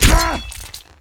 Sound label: hit; mount blade